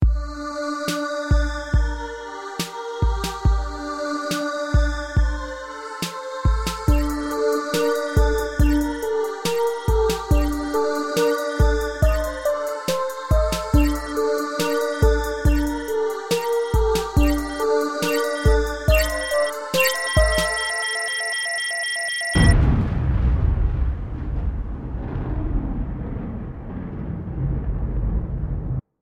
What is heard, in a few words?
Self Destruct